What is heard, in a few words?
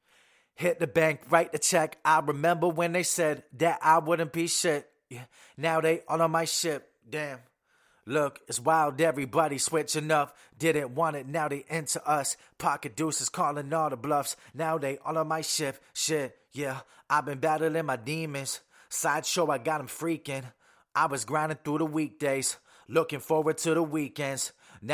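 hip hop, 60, C sharp minor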